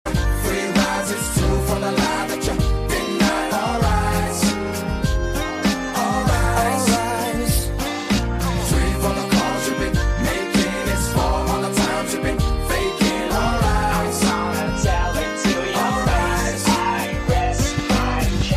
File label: english song ringtone